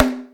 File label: GRUGRU, ETHNIC PER; AKAI S-Series CD-ROM Sound Library VOL-5